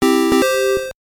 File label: Player Correct - Jingle; Jeopardy! 25th Anniversary Edition; NES